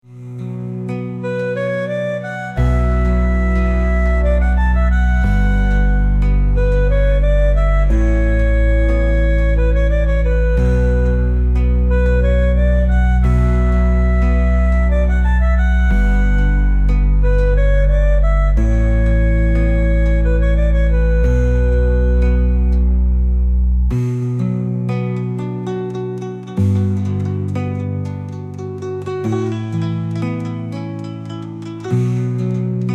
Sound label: short soothing strings guitar music